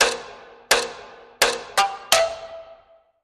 Warped Ticks 3

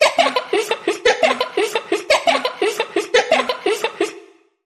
Vocals Child Laughing Pulse

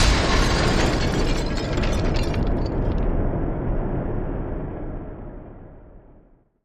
Crashy Boom Impact And Debris

